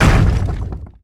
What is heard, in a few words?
boulder impact stone; ice age scrats nutty adventure